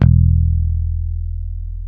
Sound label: HIPH E 2, Bass, HIP-HOP; Spectrasonics; Bass Legends CD 1